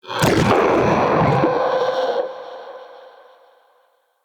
Shrimp Vocal Angry, Creatures; Baliq Shrimp Glade

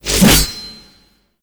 Hatchet Whoosh; Warframe; Corpus; Venus Hatchet

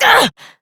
Pain Short, Adult; New War; Adult Operator

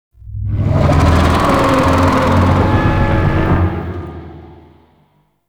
Void Dragon Vocal Pre Death; Enemies; Vocals; Warframe; Duviri

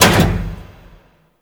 Pod Lift Start; Grineer; Canno Pod; Elevators

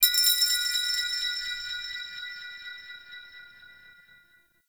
Interior Orb Station Chime; Warframe; Tau; Orbital Station

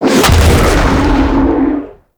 Ethereal Void Angel Weapon Fire; Abilities; Warframe; Zariman

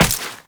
Step Ice, Footsteps, Walk; Warframe; Tenno

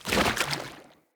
Wolf Steps Land Water, Jump; Animals

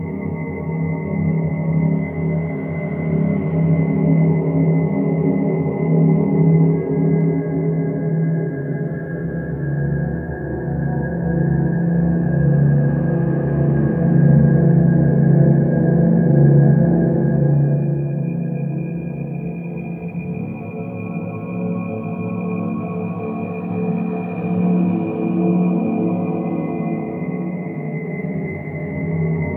Clan Dojo Research Room Amb; Warframe

